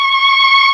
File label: MELLOTRON; East West - Global Sounds